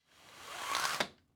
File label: Window Blinds Pull Down, Household